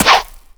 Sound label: jump modern; gameplay; sonic-boost